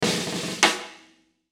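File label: Musical Element, Drum Snare March; Blastwave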